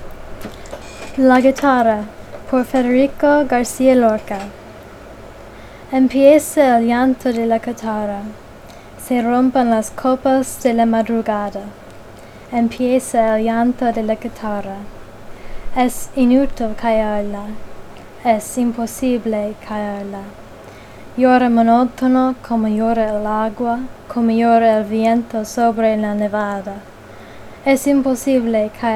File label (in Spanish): la guitarra